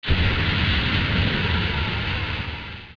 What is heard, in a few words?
Fire Shoot; Anubis